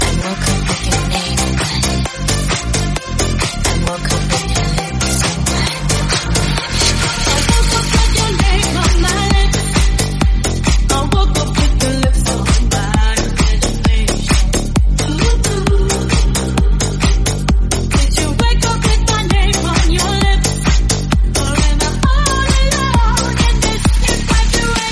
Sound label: Slam End 132bpm Gm